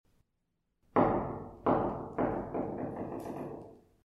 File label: golf